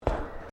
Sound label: Footsteps Boots Suspended Metal Walkway Step; Footstep Foley; Footsteps (Sound Effects:Human)